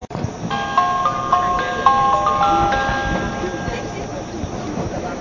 nishitetsu chime

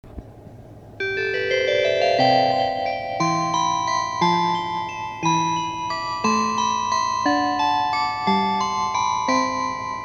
kiha 8500 chime; aizutetsudo

